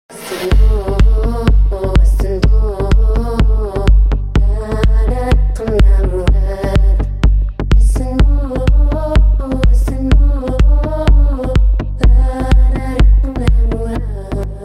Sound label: Darbuka